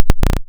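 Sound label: waveform FM sawtooth triangle envelope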